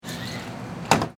Cooler Door Open, Industry, Grocery, Store, Cooler, Door, Slide, Open; Miscellaneous Bar; Blast Wave